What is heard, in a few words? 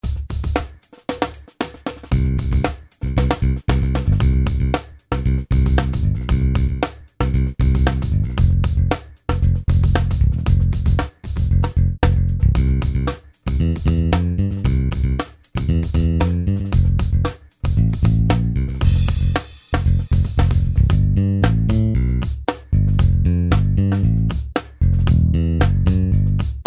DRUMS AND BASS; BR1600CD Drum Loops